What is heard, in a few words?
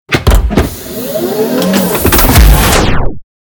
aircraft unspawn; Original TK Sounds